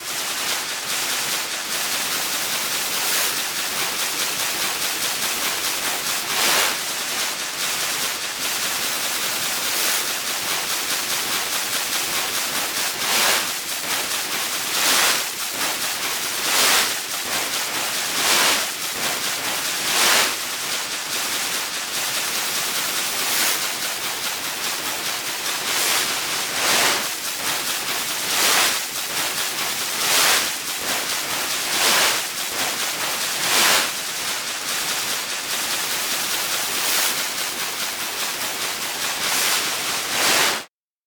sea shore

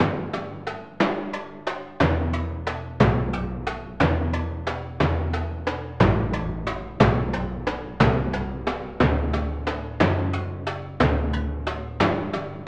timpani

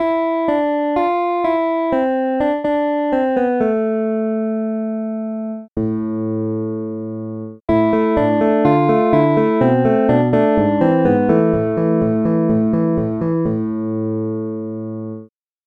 fingered bass

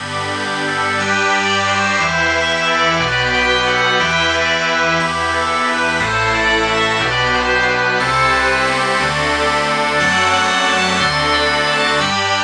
bagpipe

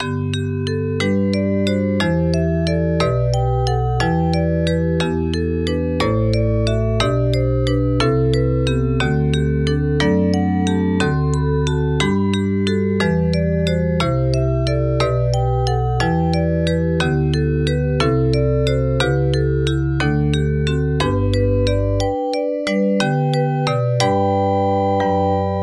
vibraphone

